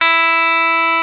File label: GUITAR E3; E-MU Formula 4000 Series Vol. 3; Analog Odyssey